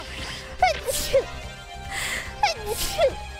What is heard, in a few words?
Sneeze